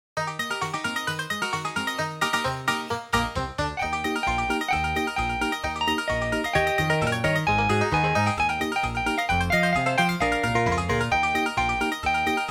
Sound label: jingle bell